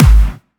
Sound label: Clubby Kicks, Bassdrums